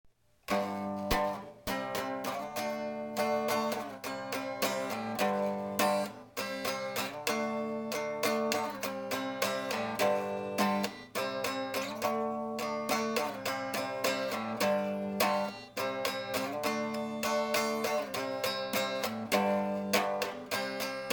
riff

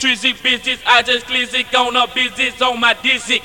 phonk male acappelas loop 118bpm C major; night drive

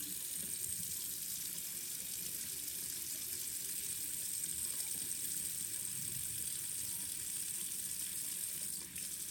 Running Water for Pouring Rain

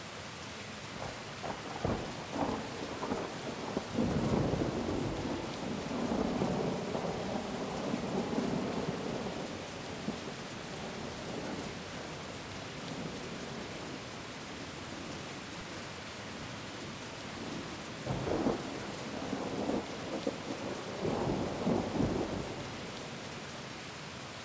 rain steady

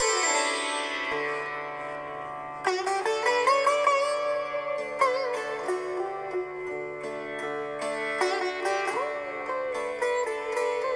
sitar

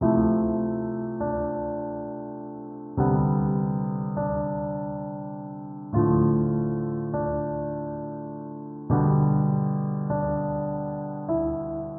lo-fi piano, 81bpm

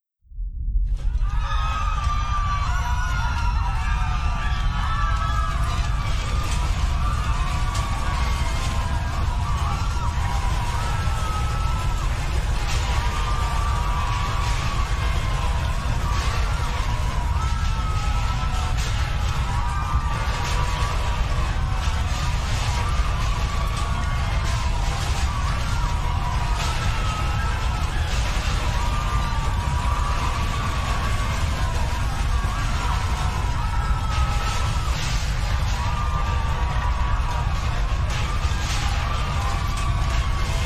Street Crowd Panic

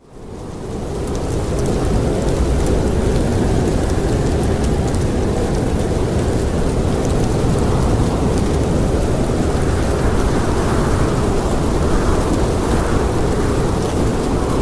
Winter Storm

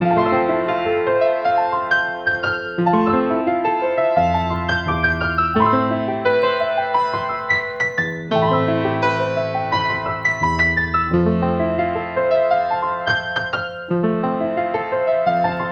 romantic piano melody 86bpm C major

